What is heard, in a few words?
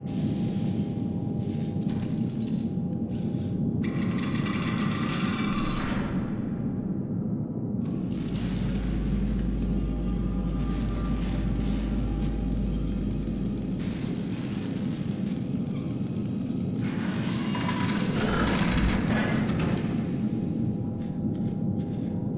Ambient Hangar Loop; Drifting Lands